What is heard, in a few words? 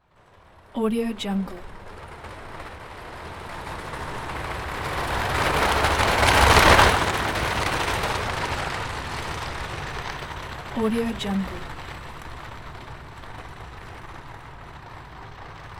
horse drawn carriage fast pass by on cobbled street; hollywoodedge